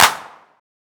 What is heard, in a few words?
Clap, Drum Kit; Clade